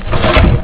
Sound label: gate open; Ronin